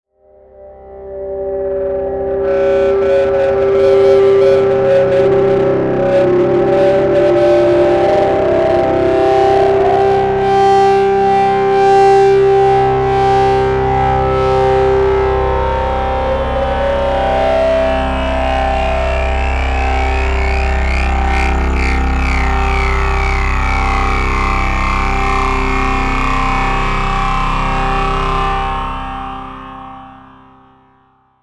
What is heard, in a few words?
Feedback Long Dark Musical Swell; Feedback Sound Effects